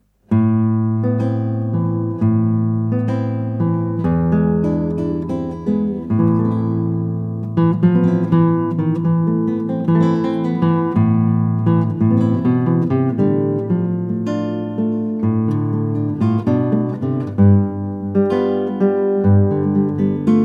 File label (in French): Le tango d Octave pour guitare